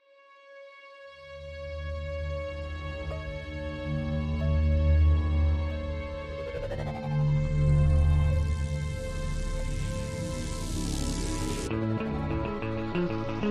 nu skool metal

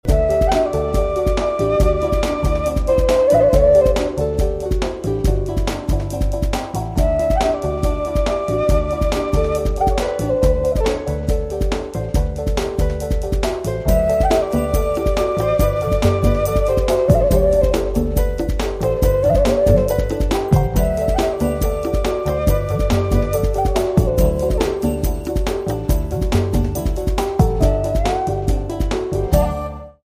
groove culture

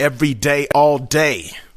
Rap Vox

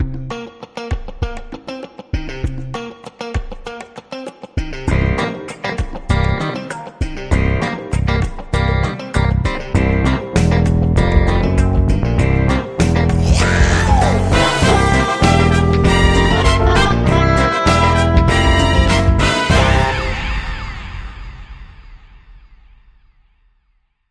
funk

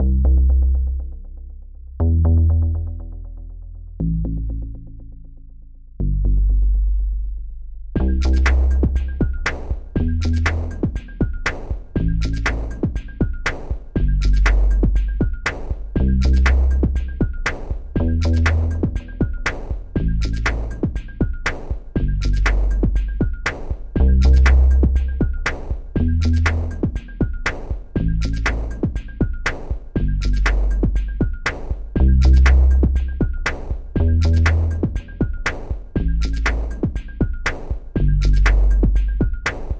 Drums and Bass

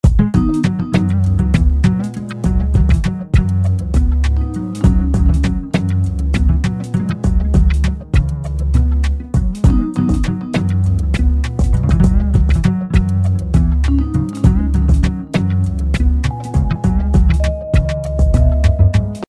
music Friendly 100

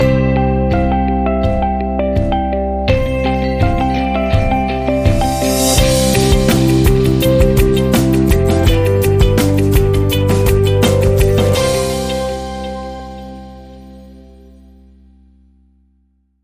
Inspiring Light

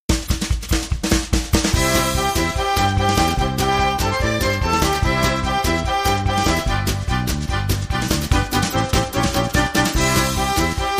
cajun

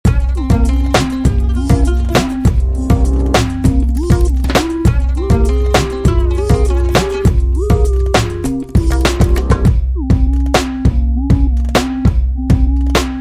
punjabi break

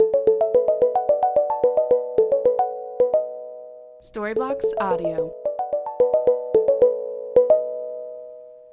Ambient House Plucks 110BPM C

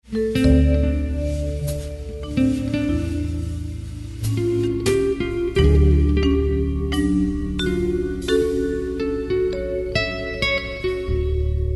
intimate

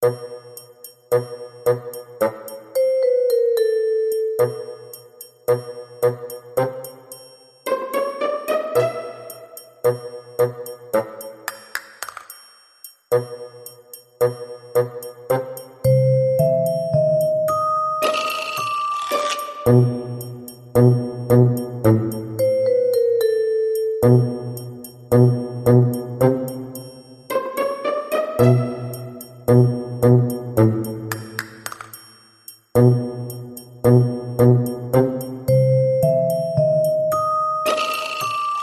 confident quack

